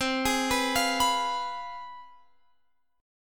C Major 7th Suspended 4th Sharp 5th, down, Guitar, Open F; CM7sus4#5 arpeggiated